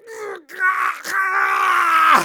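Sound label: Spy pain critical death, German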